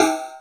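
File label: house snare drum; USB Soundscan vol.11; Drums Dance & Groove; AKAI; HOUSE KIT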